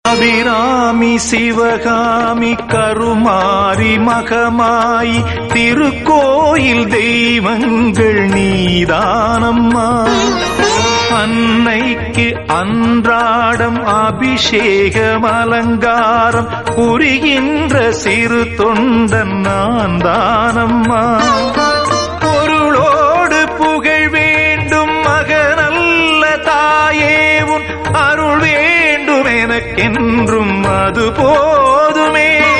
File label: Song Tamil